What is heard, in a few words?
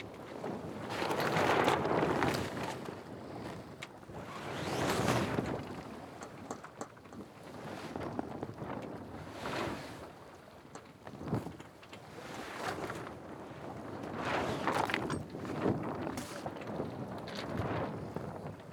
Sailing